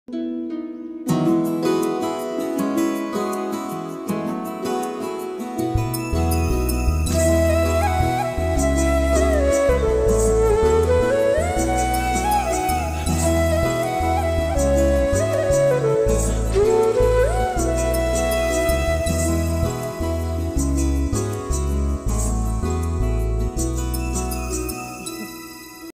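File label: original soundtrack background music ringtone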